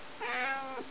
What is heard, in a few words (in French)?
miaou, chats